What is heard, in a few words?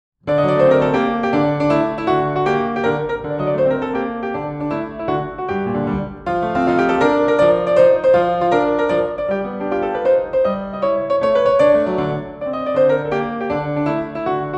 Tarantella